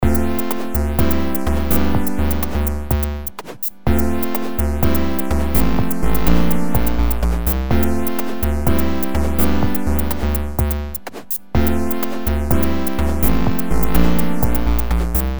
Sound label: electro funk synth